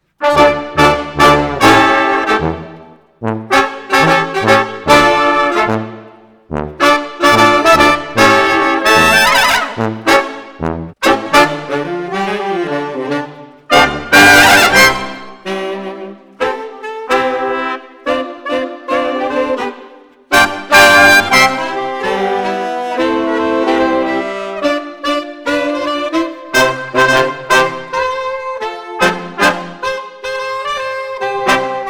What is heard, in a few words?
Brass, Jazz for piano